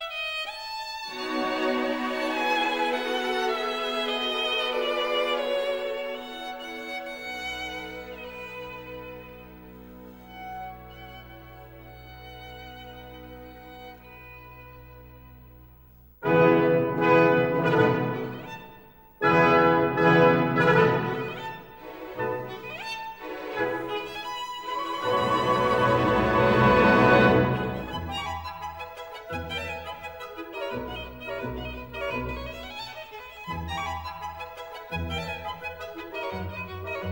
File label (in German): konzert fuer violine und orchester e moll op 64 allegretto non troppo allegro; molto vivace